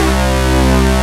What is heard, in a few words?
JUP8 SWEEP C2, Jupiter Synths; E-MU Formula 4000 Series Vol. 3; Analog Odyssey